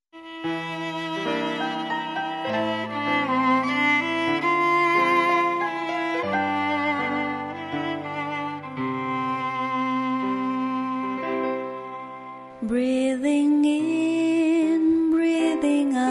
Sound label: Breathing in breathing out